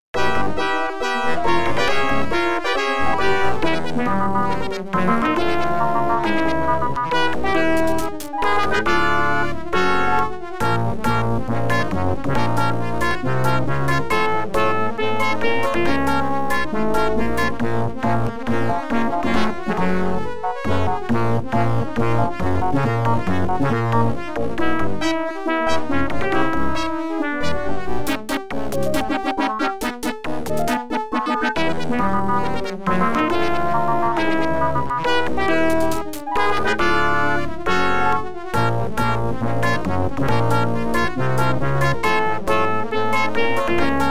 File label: OPL2; Failing; X WING